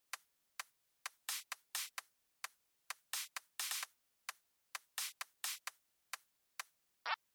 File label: pause beat